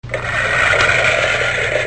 Push Lawn Mower; Machines

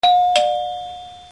Ding Dong; Music

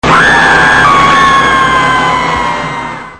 Group, Crowds

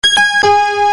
Synth

